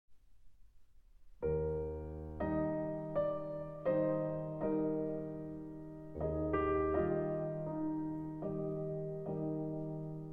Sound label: Intermezzi in E-Flat Major, Andante moderato